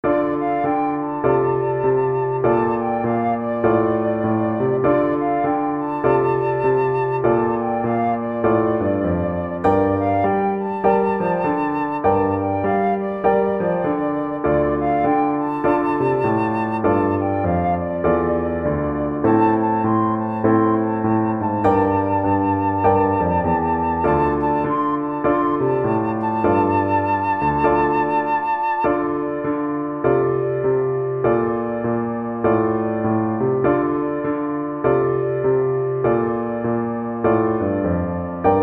blues flute

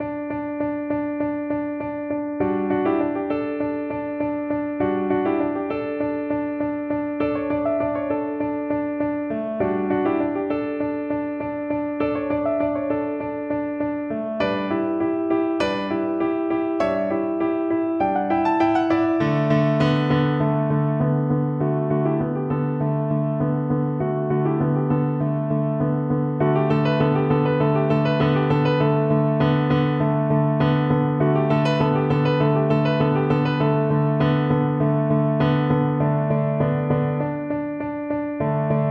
piano

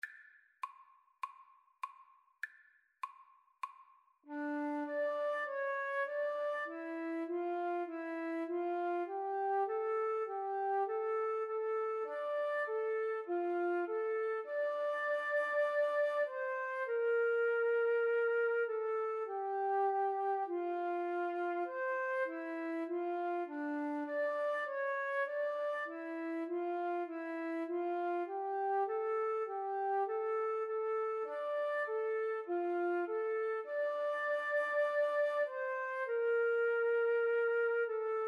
Flute Duet

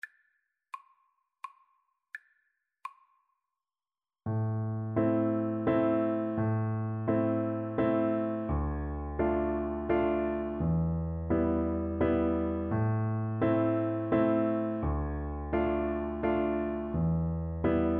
Bassoon

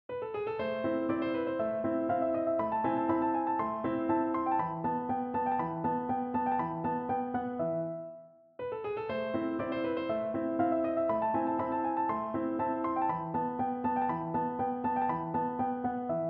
mozart turkish march, piano

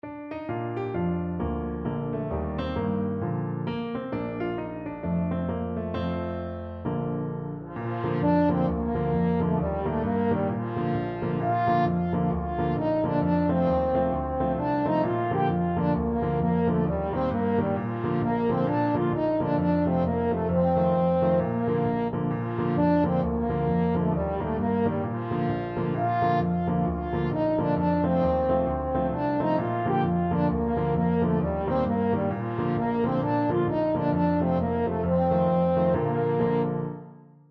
all for me grog, french horn

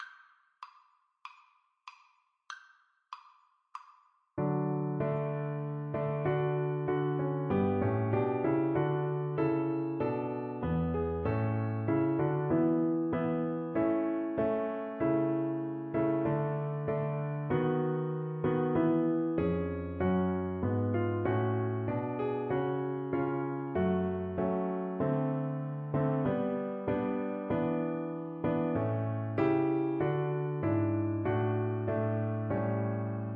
Navy Hymn viola

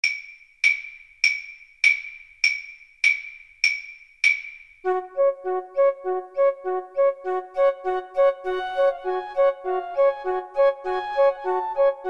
danses des cygnets flute trio

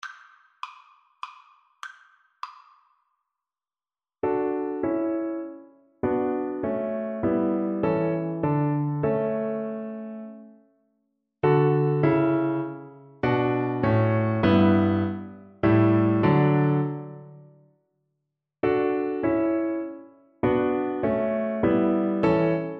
cello

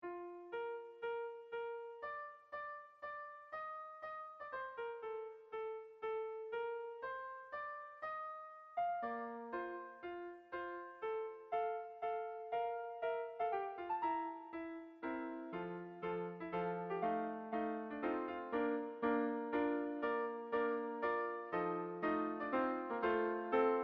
clarinet choir

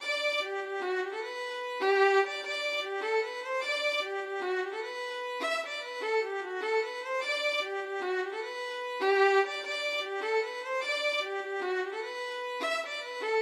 tea in the morning violin

